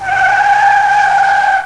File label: skid